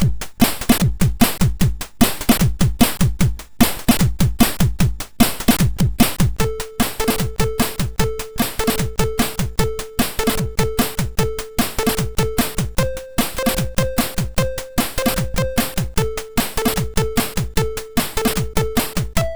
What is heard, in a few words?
Ting ting ting, MOS6581R4